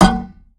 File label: tank hit small interior